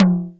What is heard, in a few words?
RSF DD Tom, DD-30